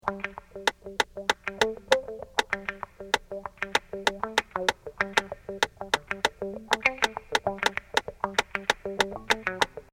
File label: loop kicking mono